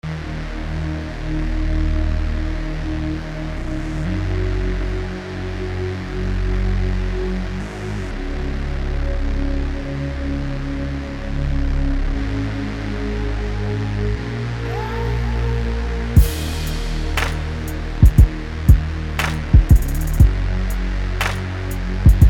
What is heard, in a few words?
Trailer Music